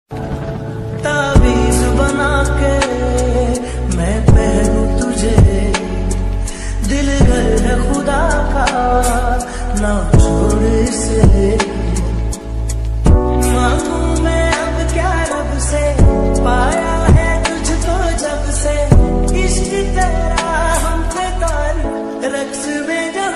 call ringtone, hindi ringtone, sad ringtone, love ringtone song